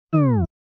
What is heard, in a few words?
close